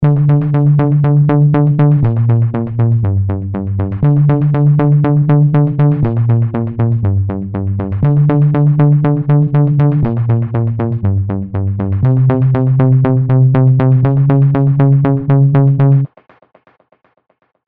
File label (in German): Bass unmoduliert; Heavyocity Mosaic